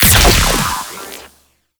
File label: Shot; Warframe; Weapons; Corpus; BFG